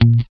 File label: GUI A2#, E-GUI MUTED; Sound & Vision; Gigapack I CD 2